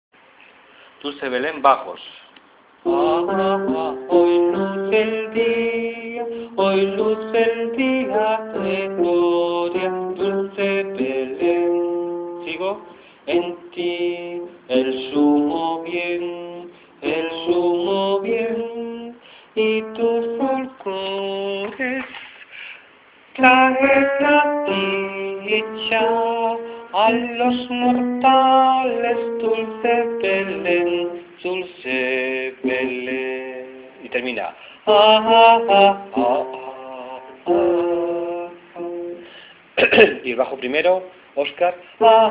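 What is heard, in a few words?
bass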